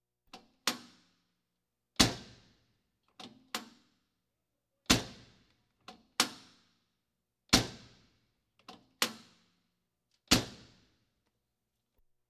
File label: Police, Fire engines